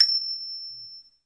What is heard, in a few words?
fingerbell open, Percussion; Western and Latin Percussion; single hits